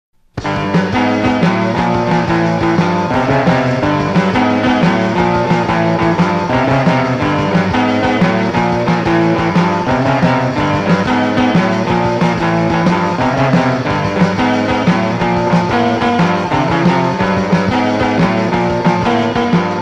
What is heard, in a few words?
Overdrive